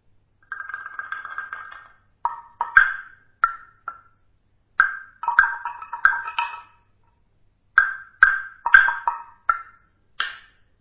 holzglocken

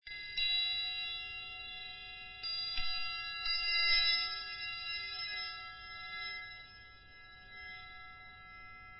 bronze triangel

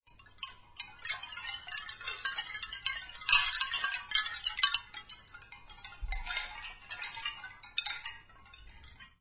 holzklangspiel

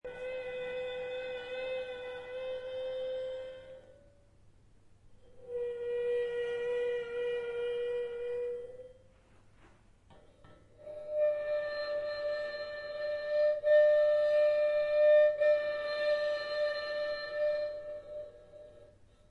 nagelgeige

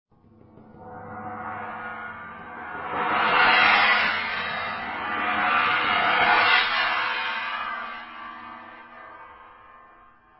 becken

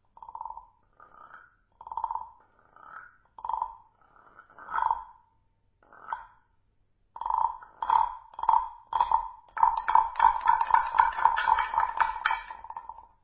schrap holzglocken

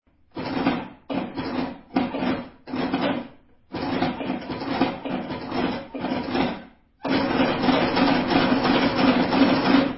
kiste

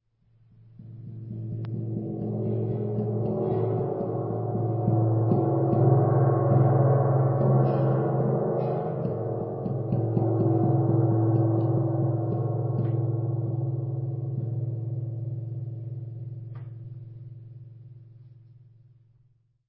grosses tam tam

